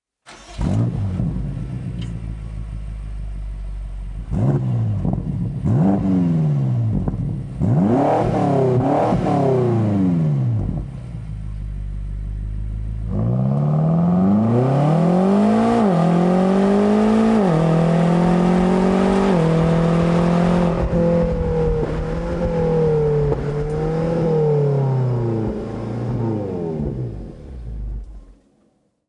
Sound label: X6M F96 sound